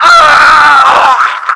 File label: die